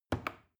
plastic button small push heavy

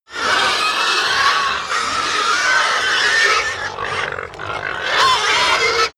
pig herd scream short

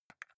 console controller xbox arrow key press

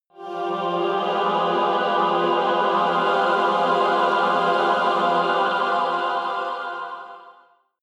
evil choir chord sequence high

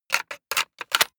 plastic device handling clack

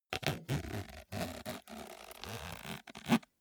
zipper small case open slow

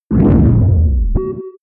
whoosh distorted drop drone

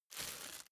plastic bag rustle

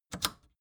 record player power click off fast

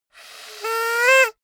hoot rise

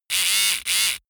servo small buzz fluctuate

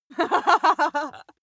female laugh short

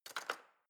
knife wood drawer place

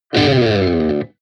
electric guitar distorted slide down

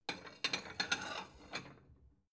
plate small shelve slide soft

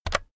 keyboard external key press very fast

